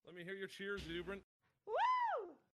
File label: cheer